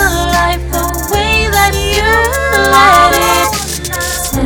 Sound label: prechorus adlibs